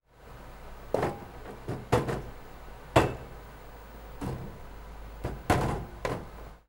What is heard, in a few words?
Bowling PU ball from rack INT